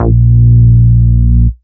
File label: Root A#, Ultimate Bass; Vengeance Samples